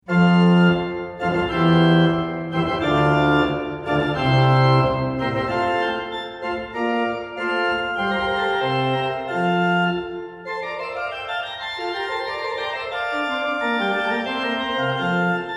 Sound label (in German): fantasie f-moll